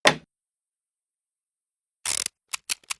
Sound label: taping plate